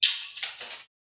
Glass Debris; SoundsCrate

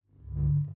lightsword swing swipes; soundscrate

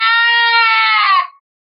horror scream; soundscrate

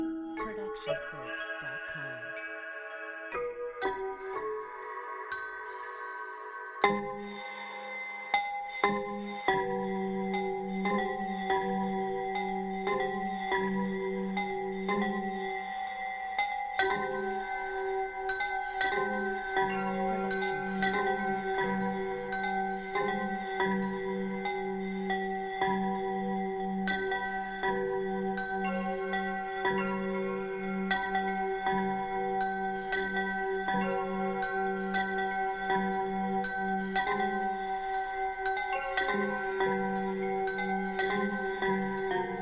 wedding bells; soundscrate

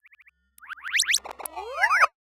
Bleep Charge Up; SoundsCrate

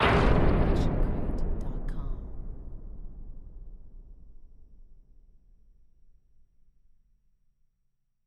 Scary Big Hit; SoundsCrate

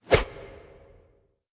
woosh

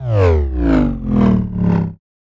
Bass Drop; SoundsCrate

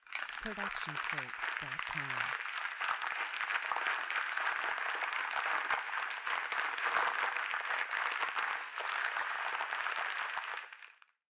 avalanched trees

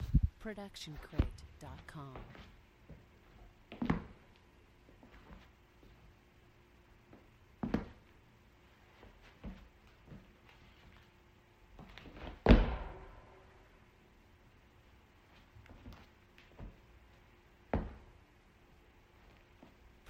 footfall boot steps; soundscrate

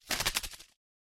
Bird Wings Fly Away; SoundsCrate

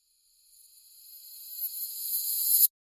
Reverse Magic Shimmer; SoundsCrate

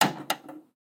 VHS buttons; SoundsCrate

